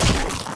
bite; Ed Edd n Eddy; Adromulus Pack; EEnE

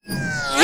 Incoming; Ed Edd n Eddy; Adromulus Pack; EEnE

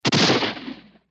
gunshot, weapons

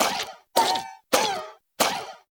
Blink, eye looking around; Ed Edd n Eddy; Adromulus Pack; EEnE

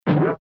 Bonk

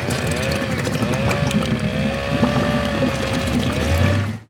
Engine Start, Go Ed Go; Ed Edd n Eddy; Adromulus Pack; EEnE

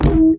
Impact boomph; Ed Edd n Eddy; Adromulus Pack

